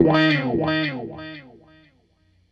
Strat Guitar Loop

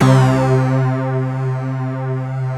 ARP, Analog Fantasies; USB Soundscan vol.49; AKAI

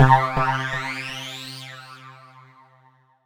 C3, SynthLine

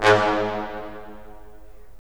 BRS, Roland LA Composer; Roland - LA Composer vol. 3

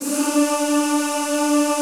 ZZZ D3, Classical Choir; Best Service; Extended Classical Choir

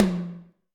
Tom Hi Dbl RM, Alesis D4

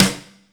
SN, Casio CTK-5000

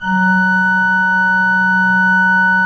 SWL F2, Cathedral Organ, SWELL WERK; Propeller Island

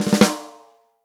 ROCK SNARE CHROMATIC, Real Drum Kits

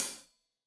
Hat Loose, Hi-Hat, Cymbals Stereo

